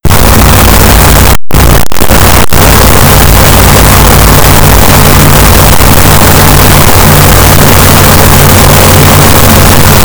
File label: office noise